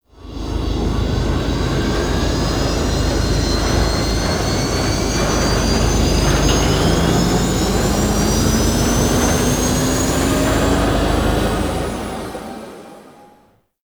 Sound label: Ship Mountain Pass Drop Off; Vehicles; Warframe; Liset; Liset Flight; Grineer Ship Liset